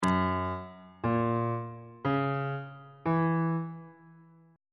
b major 7th chord 5 is f sharp dominant 7th on bass clef